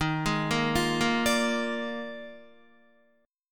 D sharp Major 7th Suspended 2nd Suspended 4th, down, Guitar; arpeggiated; JGuitar